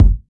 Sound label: natural bass drum one shot F sharp key, Royality free bass drum single hit tuned to the F# note